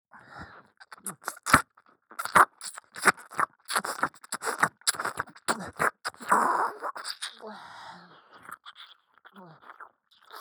耳舐め・普通・右耳; アイリス

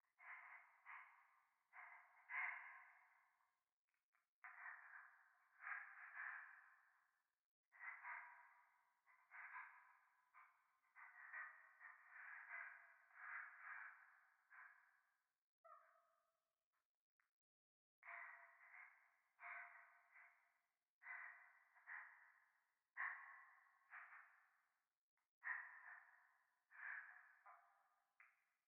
教会 左耳快感吐息

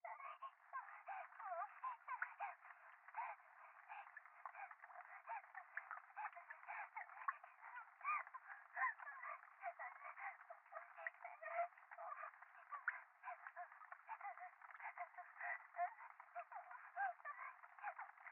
可愛い喘ぎ, ASMR; 雨上がり

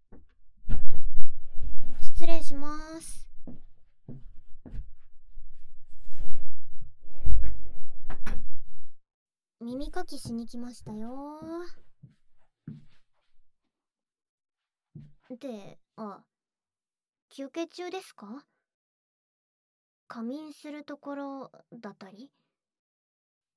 普通の綿棒で耳かき, ASMR

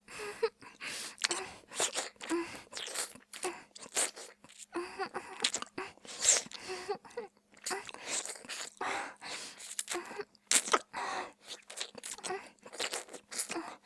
フェラ; いちゃらぶ

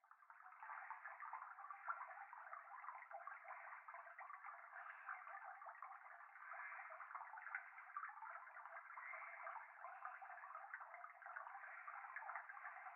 風呂 右耳密着吐息 川のせせらぎ

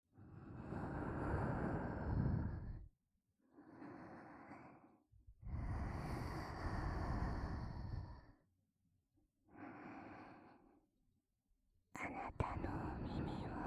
あまあま幸せ耳舐め, ASMR

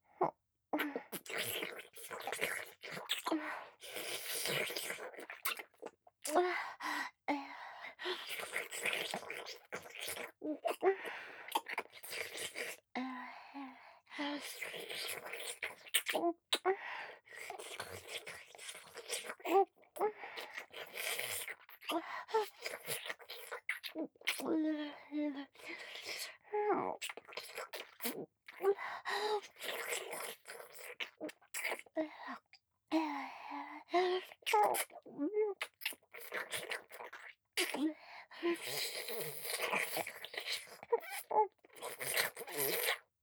喘ぎながらの乳首舐め; 乳首舐めループ

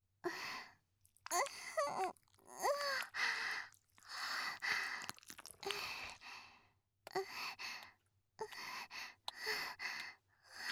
挿入 喘ぎ; SEあり

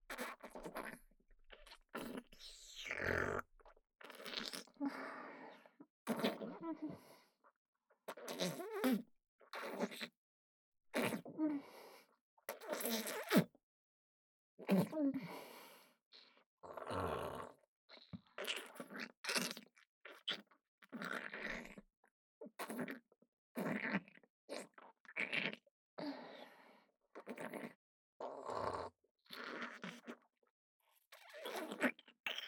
ダウナー ねっとりフェラ, ASMR; フェラ素材

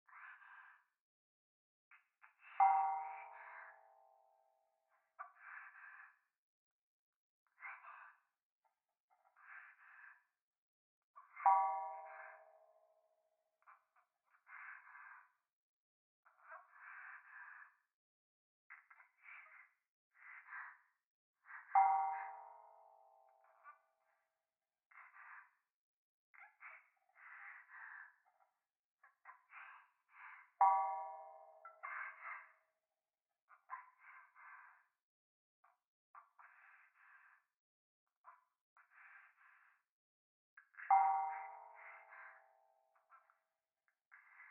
風呂 左耳密着処女喘ぎ 寺

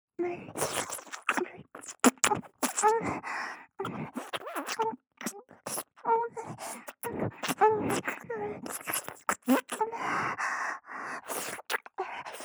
耳舐め・強め

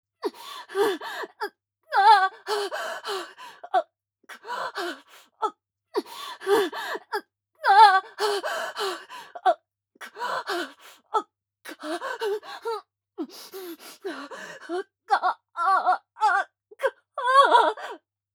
射精前の激しい息遣い; B-21 アスティリアに射精前の激しい息遣い

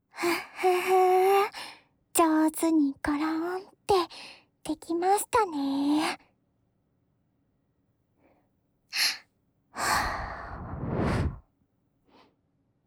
ワイヤーソフト耳かきで左のお耳をきれいに, ASMR; ゆめぐり

